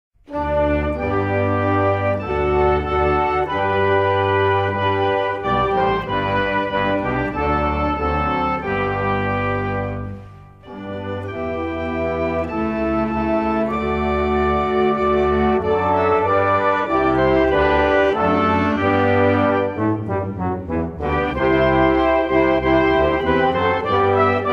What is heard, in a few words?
anthem